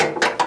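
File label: Drain, pinball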